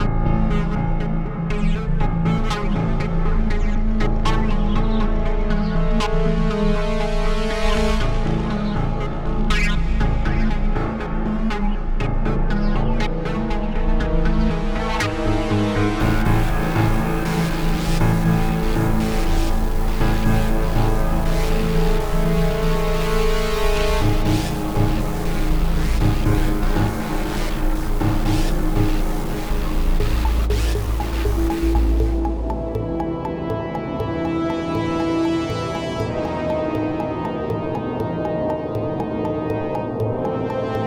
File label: Ambient Fight Intensity, Music; NWNDS